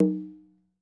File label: Conga - B; Percussion; Cymatics - Infinity Beta Pack 2.0; Zeus Percussion Collection